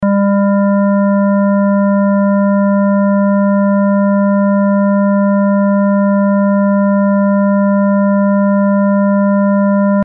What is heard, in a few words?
phase